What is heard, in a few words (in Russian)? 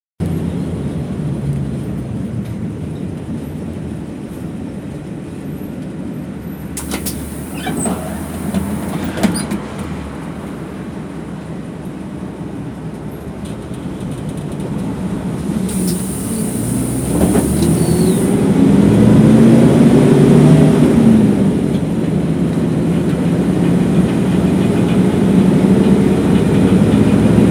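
звук маршрутки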